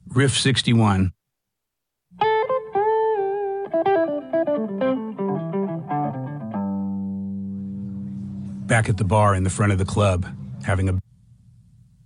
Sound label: Riff